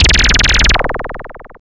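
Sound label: FX right, attacks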